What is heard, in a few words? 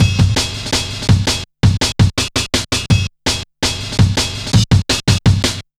Samurai, Drum Loops, 165.5, Jungle; Zero-G Jungle Warfare 1, 2 & 3